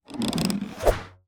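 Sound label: infantry archer, weapon; Battle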